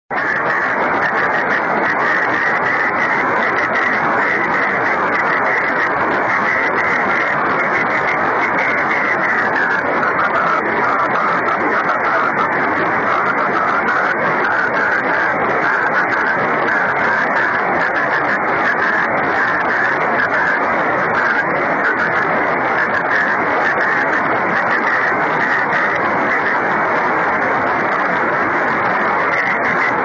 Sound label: 24G beacon; 雨、気温１８℃ 湿度７５％; JH1UGF→六本木ヒルズビーム JN1AYV←ＪＲ川崎駅水平ビーム レインスキャッタ; JH1UGF 横浜市; JN1AYV 茅ヶ崎市